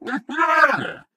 ballbot nice catch

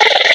box monster chitter

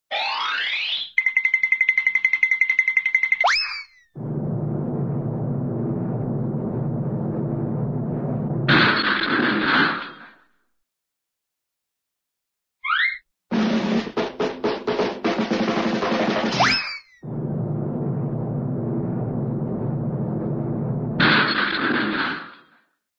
bowling; cartoon; fred flintstone and barney rubble; hannah-barbera

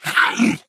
splits; Portal 2; Source Engine; video games; ballbot

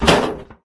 zombie on car

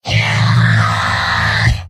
heavy negative vocalization; mvm

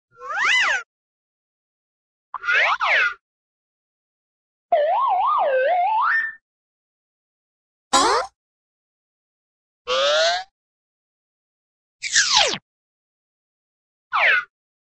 slide, cartoon fast slide up and down

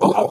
ballbot hot laser

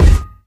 physics cube

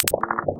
combine button; Source Engine; video game

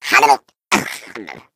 egg tease fail

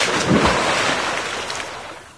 splash large; Source Engine; bshift

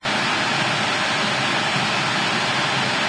tv static loop; Source Engine; de deadblock

